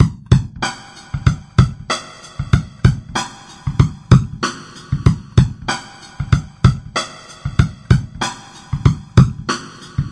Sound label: flangy mellow drums, drum loops and breaks, 095 bpm; 091 to 100 bpm